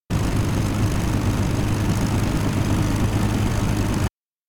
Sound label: elec plane propeller spin; anemoi